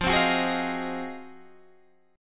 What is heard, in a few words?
F Minor 7th Flat 9th